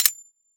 mech, glock; weapons; Urban-Coalition; Decay; arccw ud

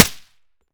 fire sup; weapons; m16; Urban-Coalition; Decay; arccw ud

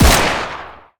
fire, uzi; Urban-Coalition; Decay; arccw ud

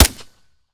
fire sup, glock; Urban-Coalition; Decay; arccw ud

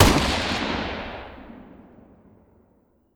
fire dist; weapons; m16; arccw ud

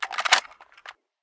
mag in, weapons mini14; arccw ud

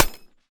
mech, m249; weapons; Urban-Coalition; Anarchy; arccw ue

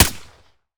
fire sup, glock; weapons; Urban-Coalition; Decay; arccw ud

